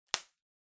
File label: Bubble Wrap (Pop Close); 気泡緩衝材; プチッ 近い; 梱包材